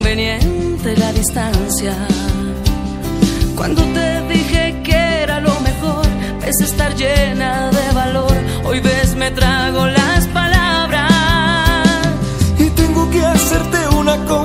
best colombian

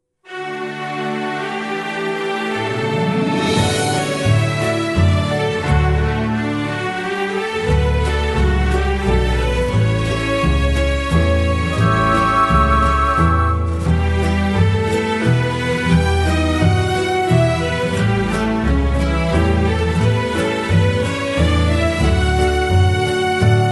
paraguayan music ringtone

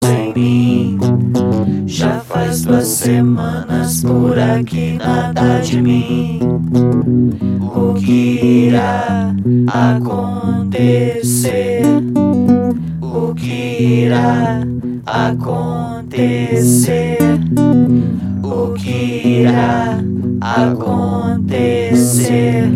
brazilian high frequency

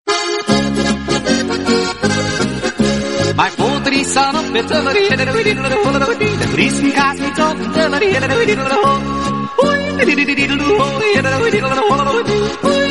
german jodel; ringtone

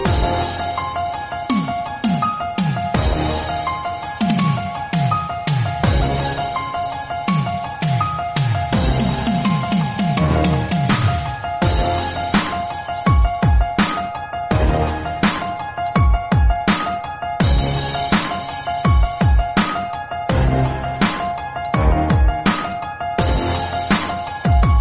ringtone

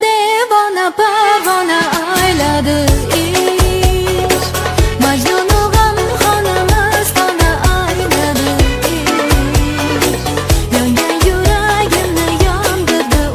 uzbek song ringtone

